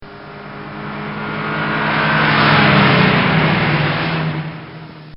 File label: Volkswagen Drive-By; Transportation; Bobby Cole